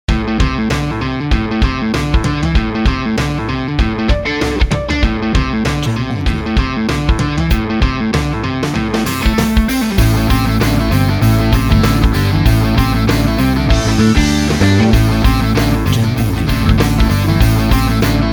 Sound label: Rock Speed Action